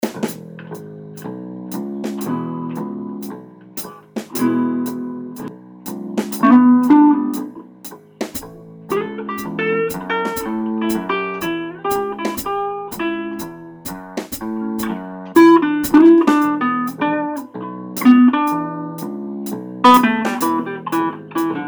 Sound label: bass riff; guitar